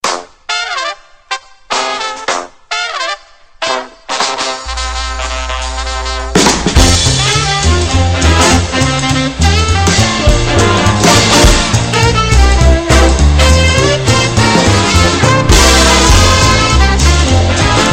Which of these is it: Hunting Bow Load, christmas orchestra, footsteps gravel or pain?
christmas orchestra